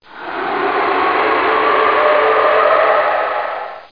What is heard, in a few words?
DTH DOOR